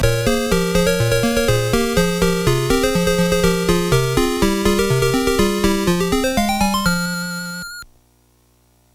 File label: Loser (Game Over); Poker Face Paul's Solitaire